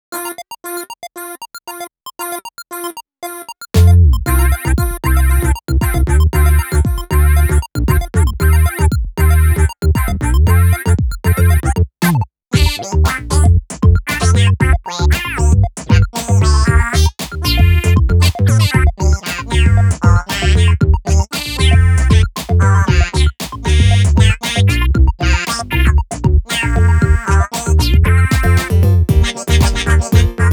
Robot Synth Instrumental; Animal Crossing New Horizons Original Soundtrack 2 2022

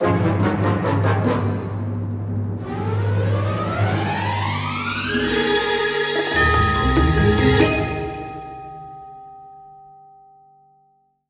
Cinematic Music - Comic Effect Stinger

